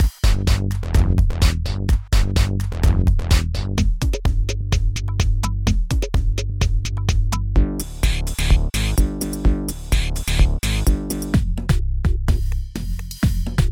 techhouse; KSize

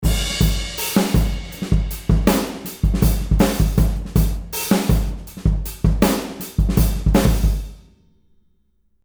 Comp Bus

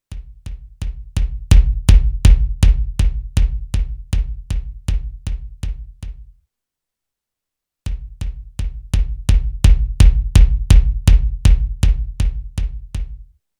Kick Drum

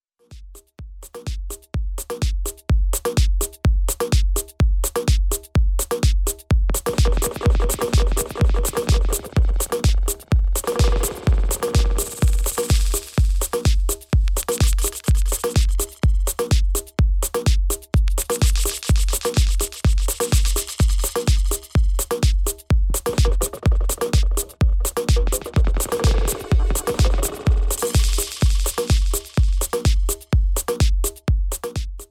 Dub Echo

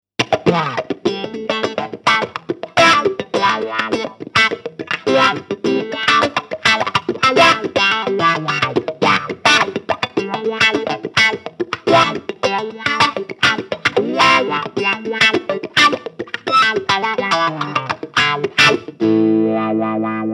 Lead Wah Boost Clean; Morley MTLW

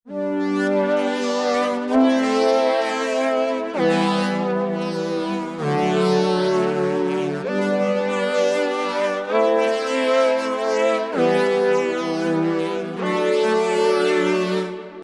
russian filter bandpass chords Stereo

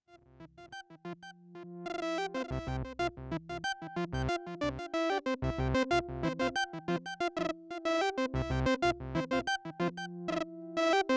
Synthesizer Reverb Hall; Specular Tempus

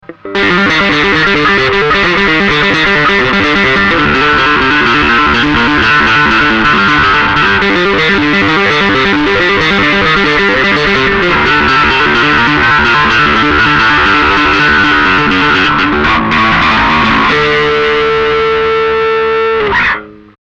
Darkglass Microtubes X7 High Band Tapping active

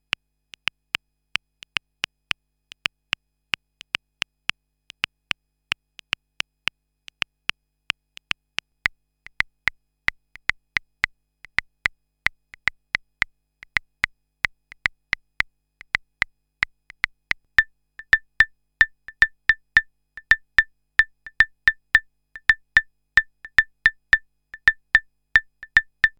Clave

